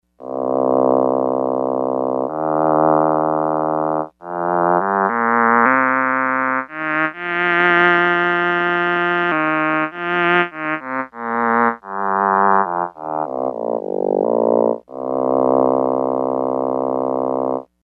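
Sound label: Cello, synths; evolver; fdm evolver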